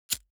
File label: lighter wheel spin